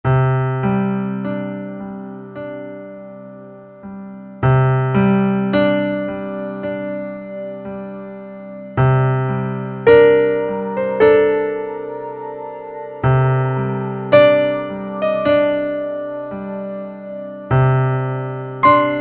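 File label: Real Ambient Piano, E-mu Morpheus; Factory Presets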